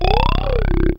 SYN C 3; Best Service Gigapack 1

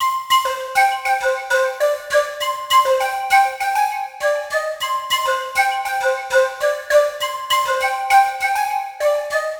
Flute 100BPM Cmin; Distaghil Star; 99Sounds x Monosounds; Instrument Loops

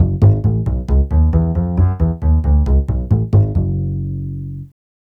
TV Suspense Bass-F#, 135; Zero-G Kocktail Kollection; Construction Kitz (Lounge); TV Suspense Mixes 135

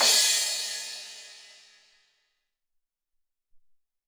16 Crash, Alesis SR-18

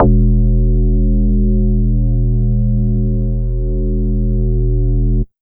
spoocky, trance, bass analog & synth; USB Soundscan vol.12; AKAI

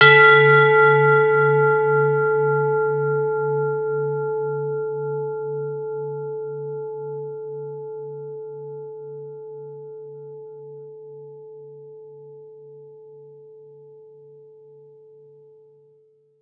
bells, bowls; Best Service ProSamples vol.52 - World Instruments 2; AKAI

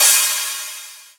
Crash, Cymbals; Vengeance - Essential Clubsounds Vol.5

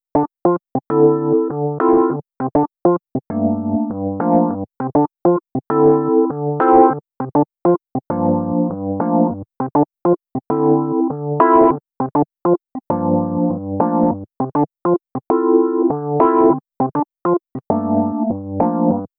EP Chords, Electro Lounge; Sonic Foundry (Sony Creative Software); 100 Rate Plan

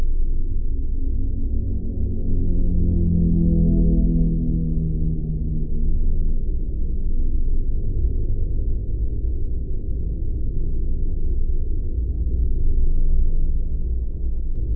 CONTAINER; AKAI Anime Sound FX; AMBIENT

